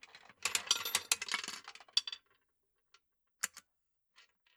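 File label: Amb Engine Start; Eve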